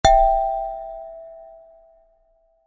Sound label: Glass; WeakAuras